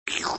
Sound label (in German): Schlurp